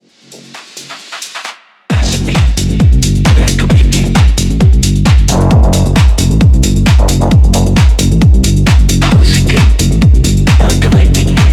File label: TECHNO COMMUNITY